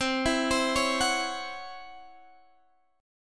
C sharp Minor Major 7th Double Flat 5th C, guitar chord